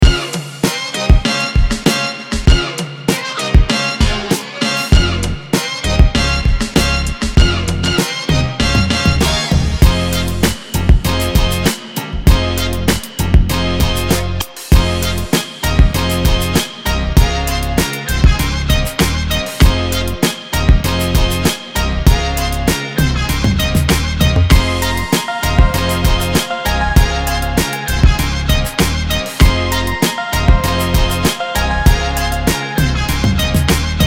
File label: hiphop